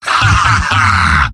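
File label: laugh short, male; demoman; tf2; garrysmod; mvm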